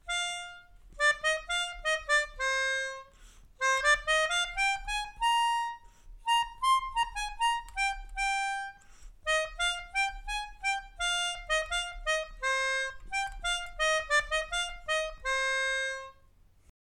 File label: Melodica